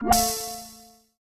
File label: wah short C, Zwoosh; 3maze